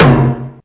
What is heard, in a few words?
DMX MT